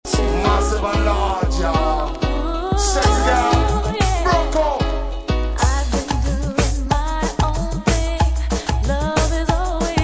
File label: RAGA MIX intro